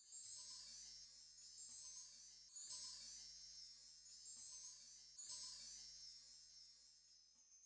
soft slider slide; osu!; Retronix 2.0

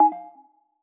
menu hit; osu!; Luminance - Fluorescence

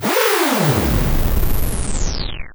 failsound; osu!; No-Game-No-Life-v12

